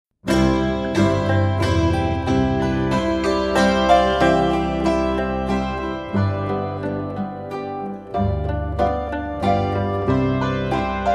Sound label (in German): menuett